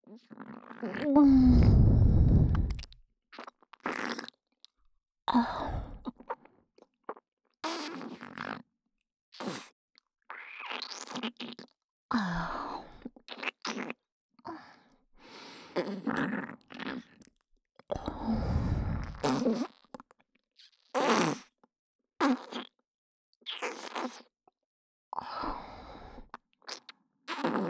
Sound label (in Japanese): 妖艶 ねっとり右耳フェラ, ASMR; フェラ素材